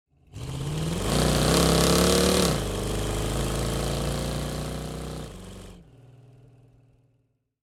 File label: Scooter drive away at fast speed